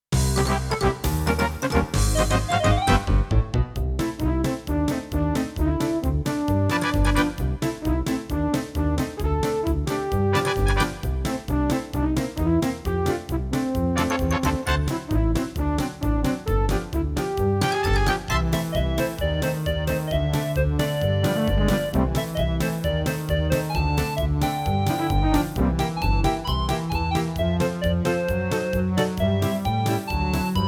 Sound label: CM500 GS; LSL3